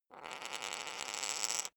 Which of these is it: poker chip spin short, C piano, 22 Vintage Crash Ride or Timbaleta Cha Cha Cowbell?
poker chip spin short